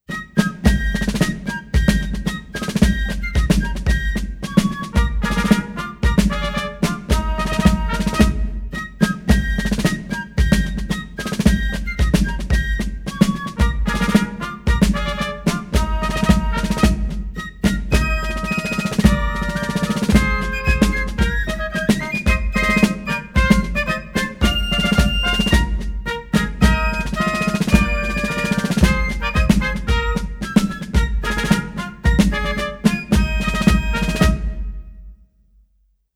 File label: Fife and Drum Corps, US Army Song The Caissons Go; The United States Army Old Guard